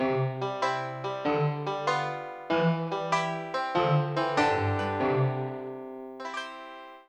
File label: Swamp; cloning clyde; xbox 360